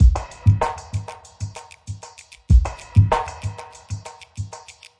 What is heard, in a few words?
096 drama echo snare, drum loops and breaks; 091 to 100 bpm